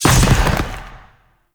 Ground Spike Burst; Enemies; Abilities; Warframe; Zariman; Void Angel

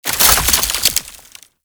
Void Heavy Scythe Crystal Detach; Warframe; Duviri; Incarnon Adapters; Melee

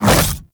Splitter Melee Swipe Small; Warframe; Infested; Grey Strain

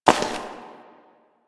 Step Ice; Warframe; Koumei

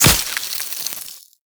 Proj Tap Impact Ice; weapons; Warframe; The New War; Omicrus

